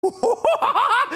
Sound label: hoohoohahaha; pennywise